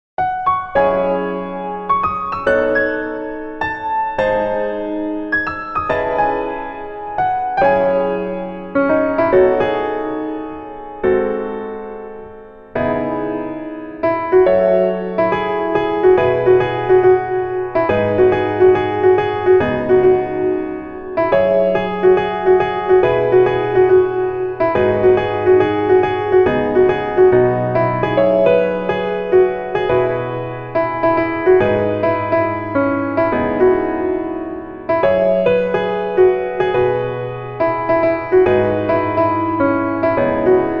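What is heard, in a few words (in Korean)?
Piano version 멜로디MR 여자키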